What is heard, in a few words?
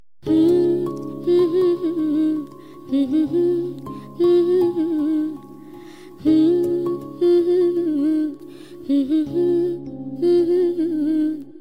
Bangla Song Humming